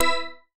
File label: Light Time; Game rip; MiSide